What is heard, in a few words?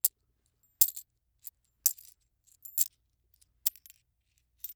Coin Jingle In Hand Slow; Foley